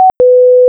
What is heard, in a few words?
fail